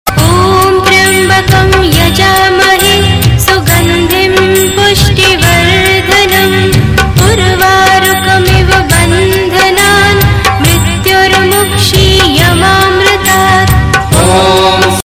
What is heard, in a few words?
mritunjay mantra; Navratri Special